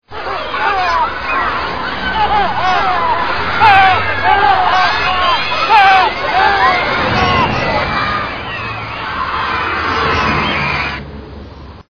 ninos efectos sonido

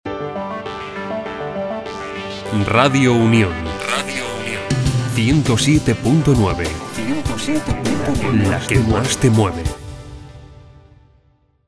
jingle radio indicativo radio; radiounion

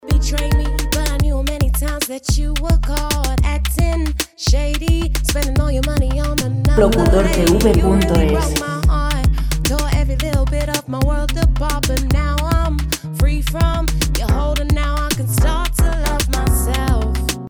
musica sin copyright 110 vocal; Música sin derechos de autor para fondos de vídeos. Música vocal

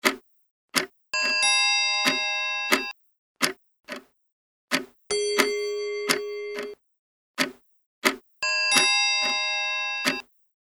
reloj y segundos efectos sonido

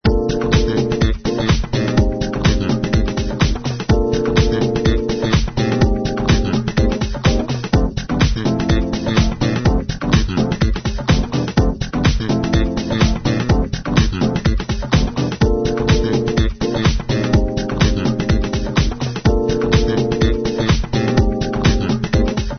jazz

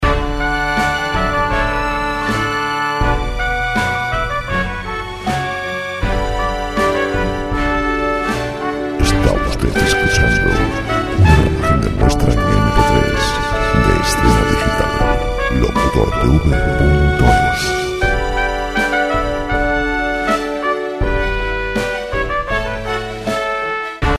canon clasico

